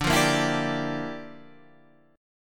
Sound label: G Minor 13th D